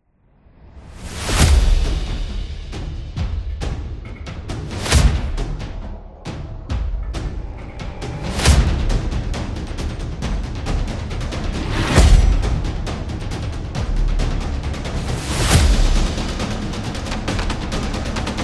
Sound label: Drum War; war drums